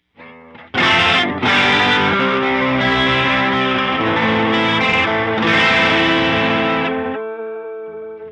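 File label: Moogerfooger MF-108S FLANGE LFO sine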